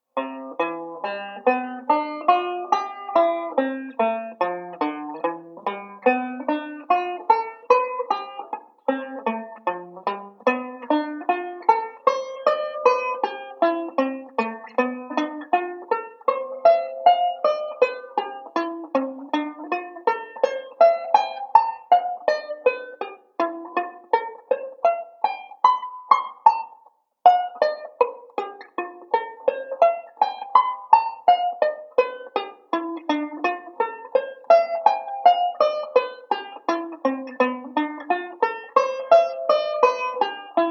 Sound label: Arpeggio Pattern; Beyond Chord Melody Volume II